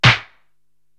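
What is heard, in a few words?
snare drum w Clap, Drum Machines, Korg TR-Rack; 300 Drum Machines; Sluggo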